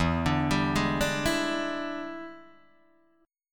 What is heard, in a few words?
E 7th Sharp 9th Guitar Open D, chord